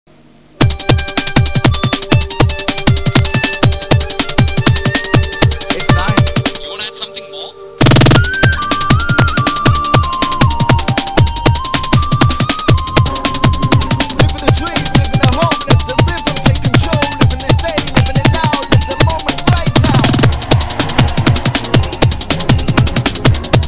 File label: Dhol N Bass; Airtel